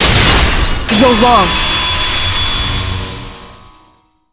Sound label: cross swords; Kirigiri